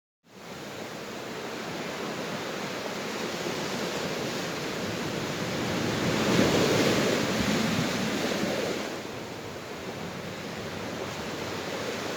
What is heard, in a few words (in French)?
Vagues ocean